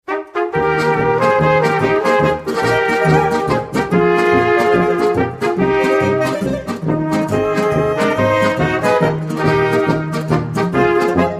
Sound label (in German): polka